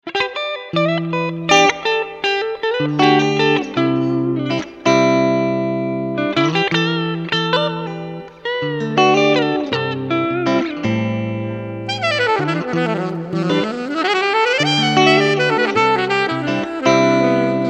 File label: Chilled Guitar and Sax